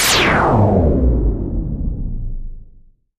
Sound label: Big Gritty Zap Blast